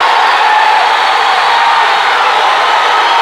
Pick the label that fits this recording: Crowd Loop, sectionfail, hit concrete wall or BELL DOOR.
Crowd Loop